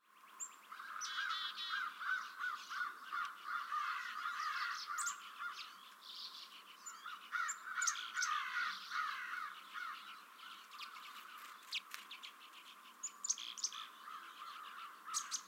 Black-capped Chickadees Common Redpolls and American Crows